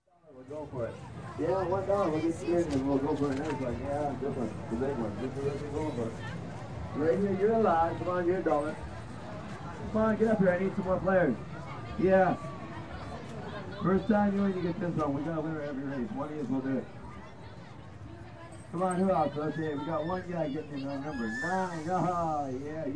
Fair, Amusement Park Ball In Hoop Game, Barker; Crowd; Sound Ideas 2000 Series

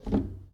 Foosball Center Rotate

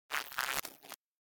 Insect Buzz - Short Fly; Hollywood Edge; sound designer's toolkit v2

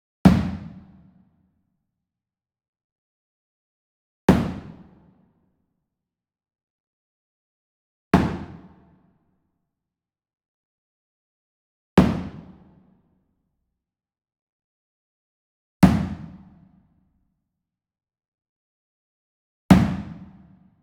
Sweetener Wood Box Hammer Hit Medium; boom library; Cinematic Strikes Construction Kit

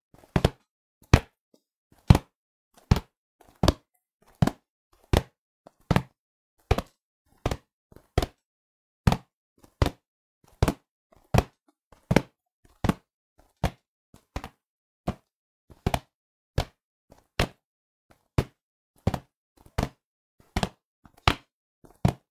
volleyball ball grab; human; NS Studios - ultra sound collection

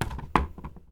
Pickup Wood Picture Frame Set; Pickups

